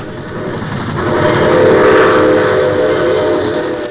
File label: steam